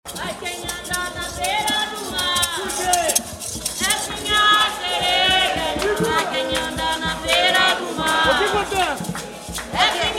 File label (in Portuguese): batuque de umbigada tiete sereia